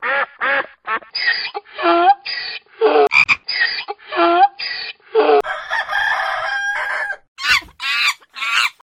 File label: string